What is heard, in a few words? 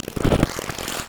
imp chatter, monsters; Doom